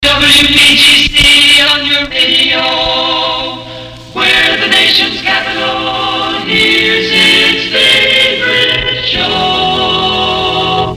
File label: jingles; futursonic; where dc hears its favorite show